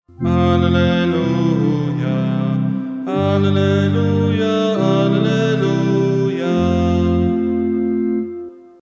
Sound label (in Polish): zwykly alleluja